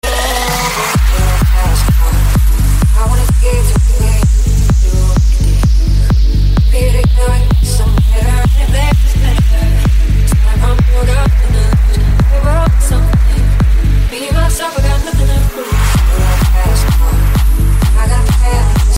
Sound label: Fast car